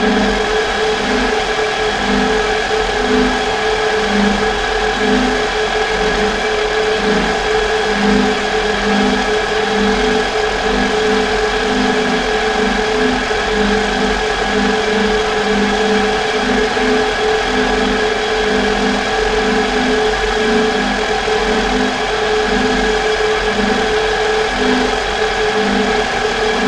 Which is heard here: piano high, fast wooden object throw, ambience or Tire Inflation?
ambience